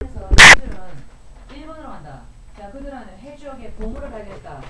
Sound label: horror